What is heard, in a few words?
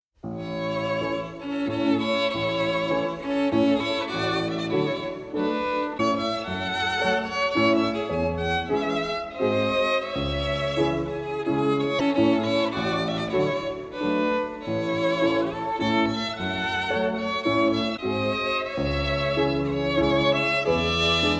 Waltzes in A Major Arr for Violin Piano